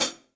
Hihat Closed Shank Session Dry; Drum Hits; Standard Hits; Ableton; Factory Packs; Session Drums Studio

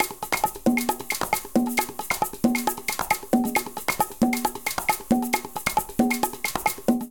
Rhumba 135bpm, Latin Percussion; Ableton; Factory Packs

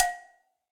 Cowbell Mid Direct Natural; Drums; Drum Hits; Standard Hits; Ableton; Factory Packs; Session Drums Studio

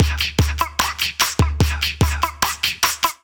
Basic Beat Box Kit 74 bpm; Percussion; Ableton; Factory Packs; Voice Box

